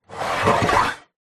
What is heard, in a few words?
wooden sliding toilet roll storage tower slide open or close; household